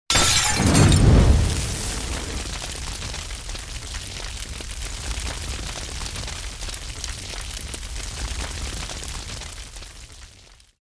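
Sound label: molotov cocktail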